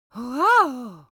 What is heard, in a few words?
cartoon vocal amazed grandma; smartsoundfx